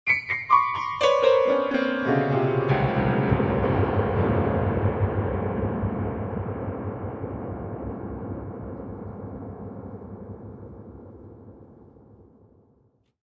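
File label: Grand Piano Scary Descending; keyboards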